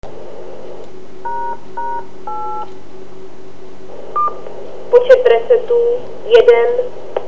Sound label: air DTMF cmd cross cross; OK0BEZ